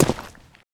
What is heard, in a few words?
dirt, footsteps; player